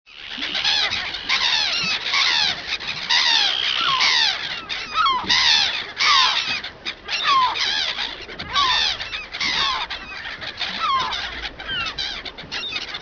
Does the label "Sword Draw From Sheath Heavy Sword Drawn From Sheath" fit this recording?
No